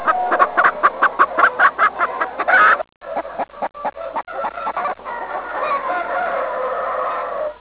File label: chickens